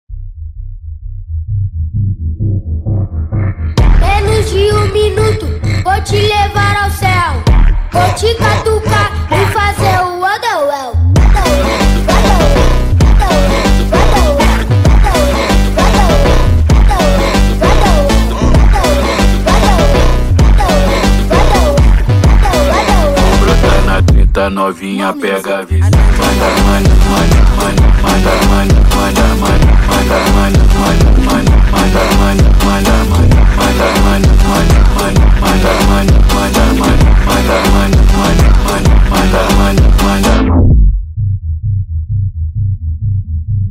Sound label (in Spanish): TikTok Song